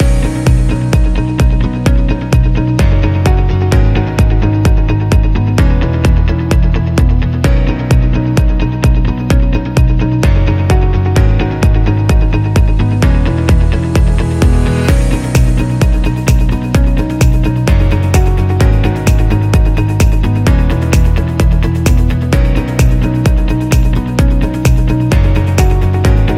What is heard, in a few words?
Looping; hi tech